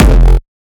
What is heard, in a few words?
kick oneshot monster F; GhostHack